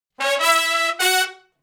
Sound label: Shuffle Blues Riff (F#) high unison, Horncraft for R&B Sections